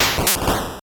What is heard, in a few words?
8bit se